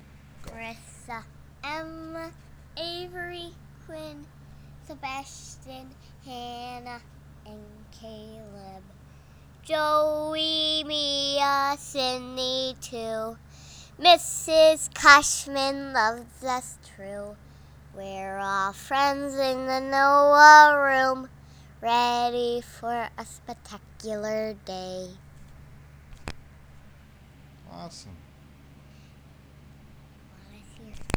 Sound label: singing school song; the song that the class began every day with